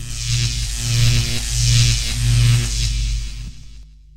electrocute

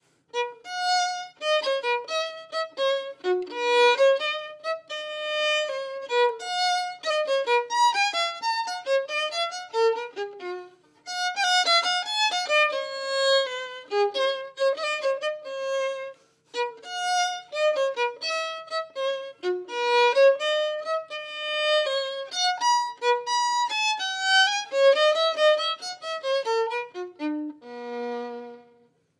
Violin; Assassin's Creed Syndicate

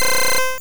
Aurora Beam; sfx; attack moves; pokemon; gen 1; rby

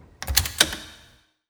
object door fridge close

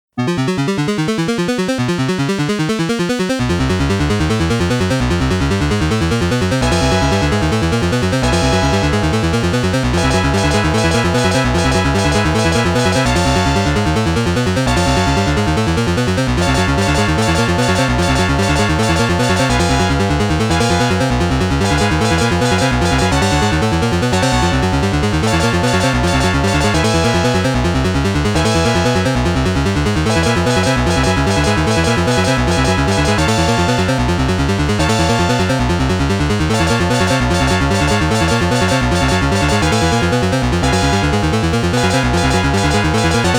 Stage 10 Boss BGM; Gun Smoke

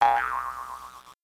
Boing Sound; Learn to Fly 3

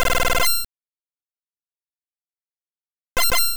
Blizzard

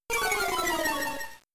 Stat Fall Down

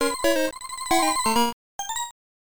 Lose (Hit Ghost)

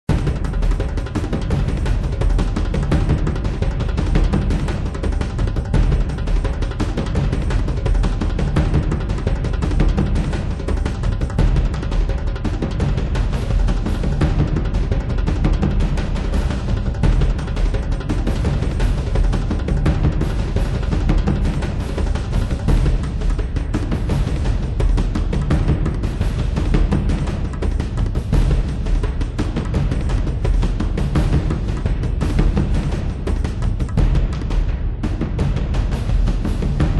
Fight

